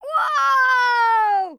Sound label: lose; pacman sfxt